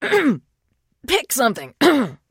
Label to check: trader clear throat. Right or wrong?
Right